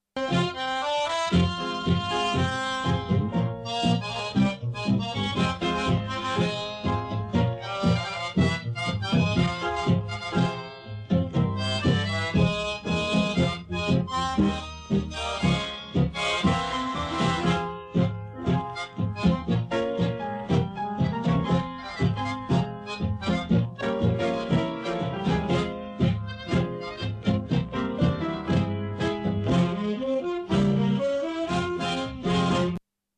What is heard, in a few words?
Old Ukrainian tango